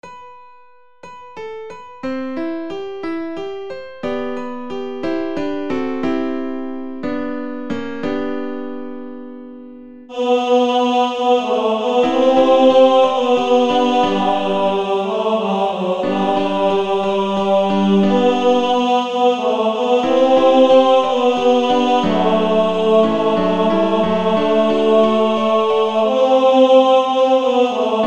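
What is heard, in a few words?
with Piano, tenor tenor bass, practice music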